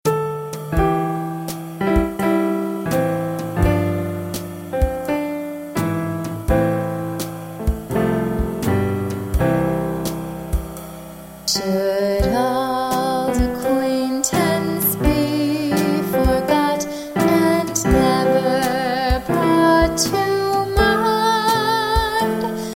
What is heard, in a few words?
vocal; Auld Lang Syne